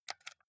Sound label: console controller xbox home press